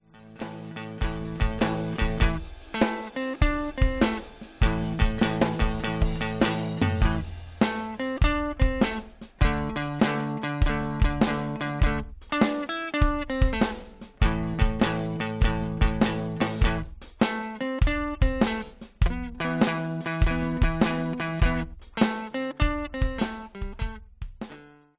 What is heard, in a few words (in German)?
blues solo 6 e drum backing track 90bpm